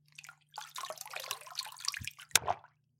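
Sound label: gently dive underwater filter added at the end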